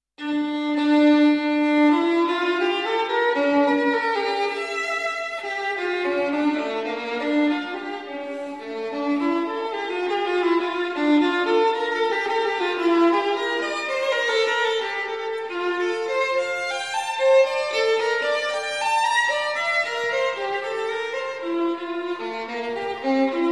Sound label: Partita in D Minor for Solo Violin; Partita No. 2; BWV 1004